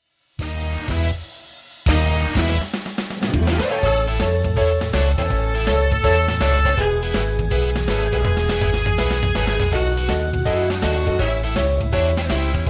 Kurzversion Playback

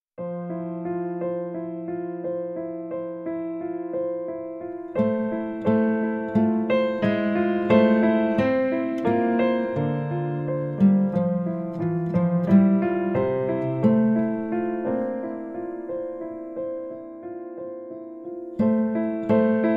StudioChor

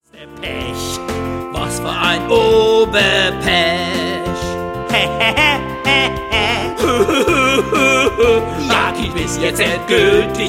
Acapella Maennergesang

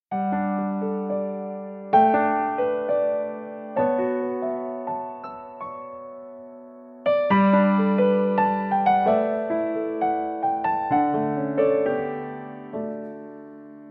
StudioChor

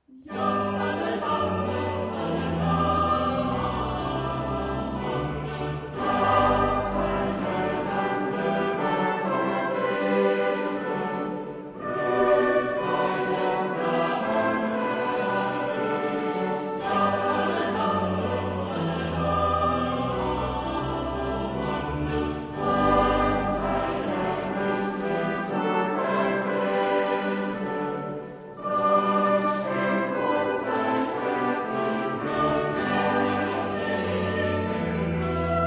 Psalm, Chor; Psalm 66

